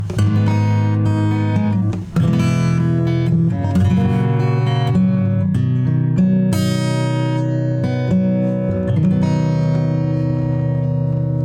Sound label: acoustic POWER 100; Joe Chiccarelli